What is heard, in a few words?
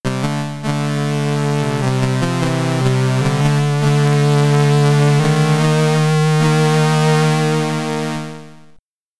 Game Over, SID Stereo; The Jump; Rambo First Blood Part II; Commodore 64